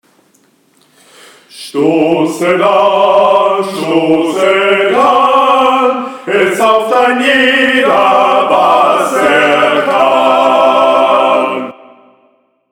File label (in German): Stosset an ALLE STIMMEN; Gesang